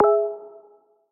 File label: hangouts message